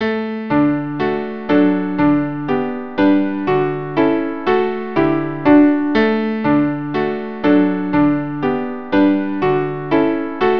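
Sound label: viola piano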